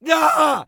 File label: death; Escape from Tarkov